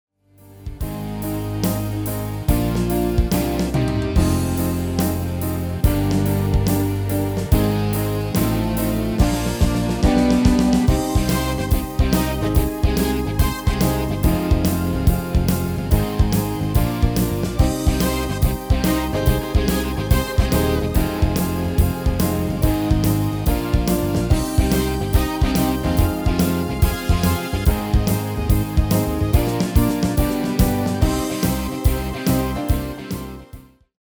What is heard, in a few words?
MIDI